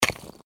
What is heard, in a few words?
slime impact; Adventure Time - Elemental; ThrillGames